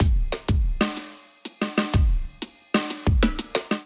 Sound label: Kit groove 124 bpm, Drum; MIDI Clips; Sense 7 Kit groove; Ableton; Factory Packs; Drum Essentials